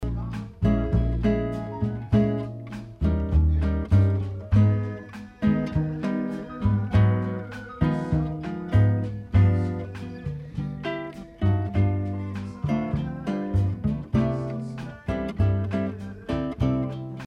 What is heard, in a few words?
Bass and Guitar together